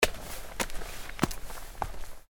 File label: steps on stairs